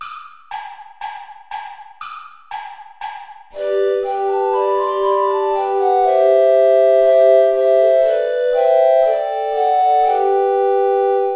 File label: play along without bass recorder; steuerlein johann; mit lieb bin ich umfangen